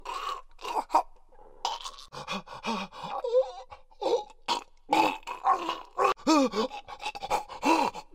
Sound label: enemies runner sound idle